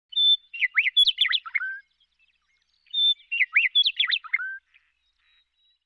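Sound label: meadowlark western, birdsong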